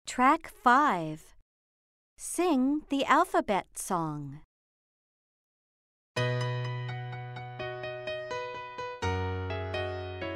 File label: Sing the Alphabet Song